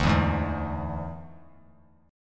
D Suspended 2nd Flat 5th D; strummed

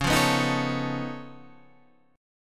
C sharp Augmented 9th C sharp down, chord

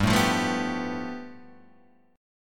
A 7th Suspended 2nd Sharp 5th

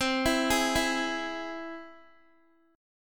C Augmented down Guitar; arpeggiated

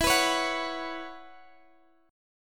B Suspended 2nd Suspended 4th

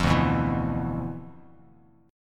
D Minor 6th Add 9th D, Bass Standard; Dm6add9 strummed; JGuitar

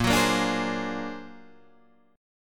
Bb Augmented 9th Bb, Guitar Open D

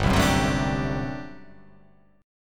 G Major 7th Suspended 2nd Suspended 4th G down

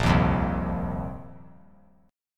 Bb Suspended 2nd Suspended 4th Bb; Bbsus2sus4 strummed; 10,10,8,10; JGuitar

